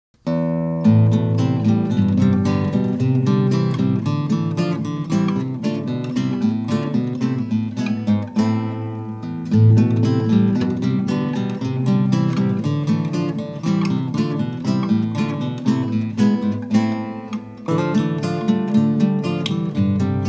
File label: toccatamotiv interlude